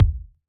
Ball Collision Geo Tackleball Med

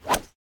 Ball Player Hand Hit Golfball Hard

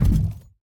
Hand Paddle Attack Hard

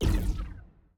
ball collision geo ricco

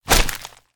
Slime Splat; Deathrun

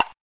Quest Timer Tock; Quests

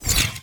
Pulse Wrench On Release

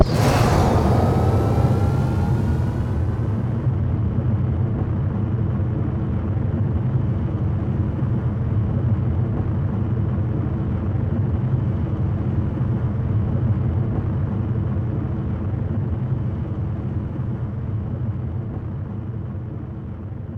Rocket Long Launch Loop

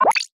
UI Alert Robot Vocal; B00M ONE

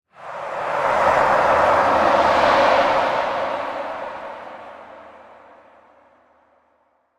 Hype Swell; Sports; Tackleball

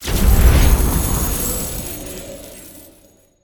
Reward Shower; UI; Joules

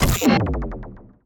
Unhacked Status; Heartball

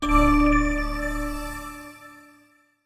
Announcer Event Notify

